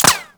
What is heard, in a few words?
Bullet Impact Metal